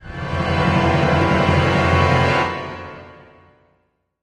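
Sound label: Music Transition Orchestral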